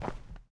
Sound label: step concrete